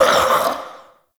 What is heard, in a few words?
Mockery Male Vocal Pain; Characters; Enemy; Vocals; Mockeries